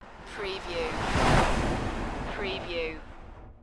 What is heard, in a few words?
Skiing, Snowboarding, Snowboarder or skier pass by fast, right to left